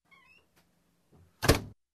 Creaky Door close

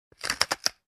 Pepper Mill

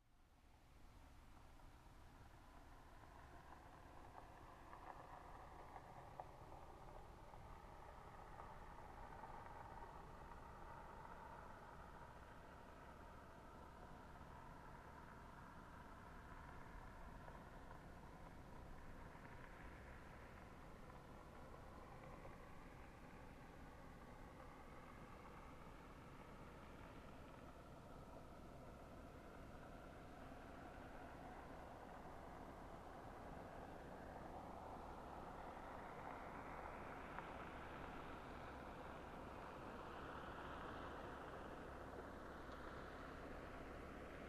Car approaching and parking

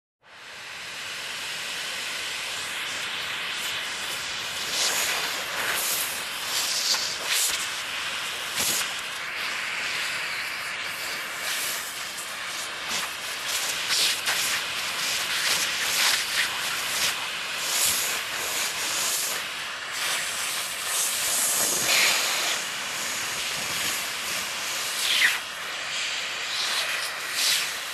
Cleaning with vacuum cleaner

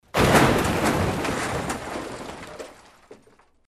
Rock Explosion

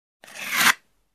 Stone grinding on wood stick

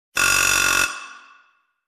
Aluminum Drilling

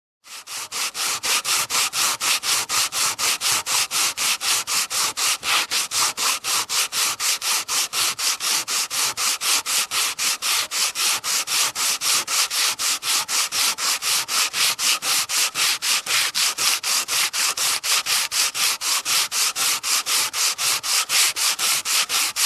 Construction

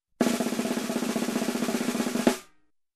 Tension build Snare Roll

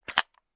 Camera Lens Shutter Clank

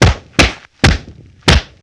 Kung Fu Old School Hit Combo

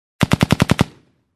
Close machine gun fire short

